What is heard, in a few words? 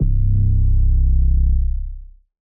808s